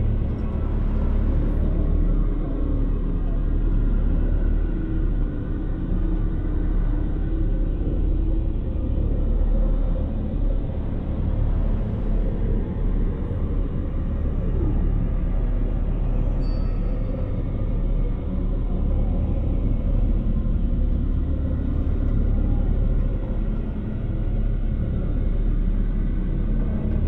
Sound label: ship starbase idle; Stellaris; shroud